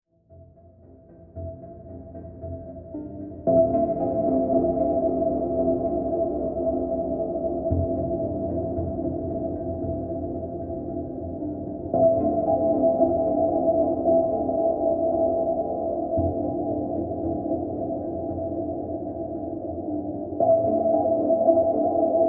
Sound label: Ambient